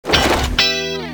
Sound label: draw, weapons